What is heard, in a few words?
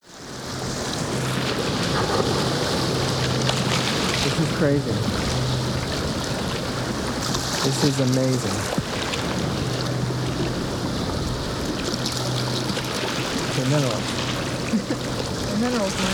ocean conversation session